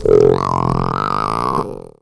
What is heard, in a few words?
DIE